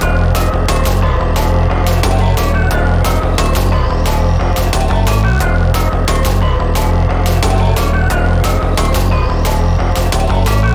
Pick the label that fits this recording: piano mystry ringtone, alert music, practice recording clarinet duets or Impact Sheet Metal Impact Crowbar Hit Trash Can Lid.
alert music